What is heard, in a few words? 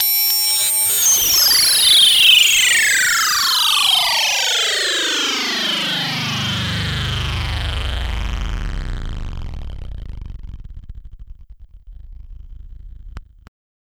FX SWEEP, SYNTHSWEEPS